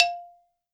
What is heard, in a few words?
BALAFON